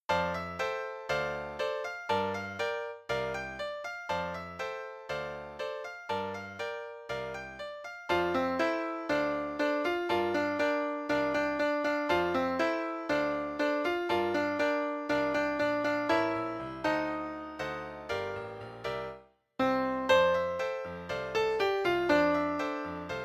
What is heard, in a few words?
Alto 2 piano 120 bpm